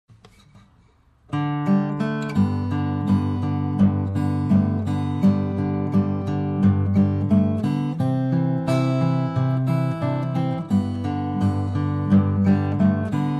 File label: fingerstyle, guitar